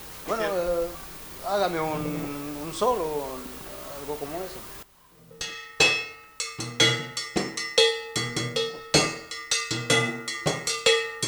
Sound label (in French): musique Un solo de timbales